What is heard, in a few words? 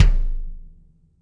Kick Shard; ZeroGravity Drum Shards